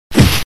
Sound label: Punch; Sonic Smash Brothers; Flash